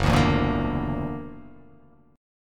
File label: Gb Augmented 7th Gb down; Gb+7 strummed